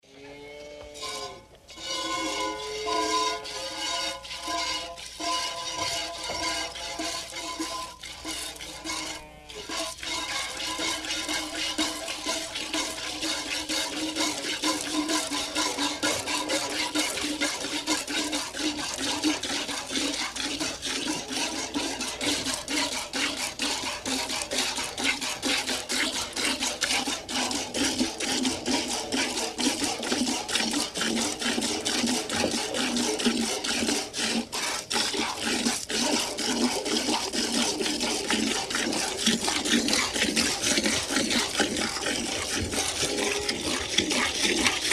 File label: Milking cow by hand, milk squirting into metal bucket; cows